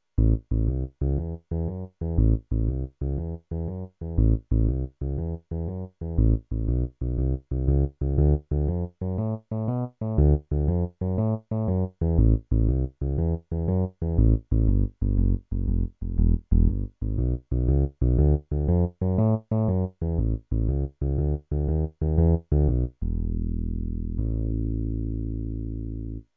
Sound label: Rock Bass